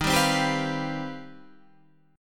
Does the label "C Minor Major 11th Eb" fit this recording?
Yes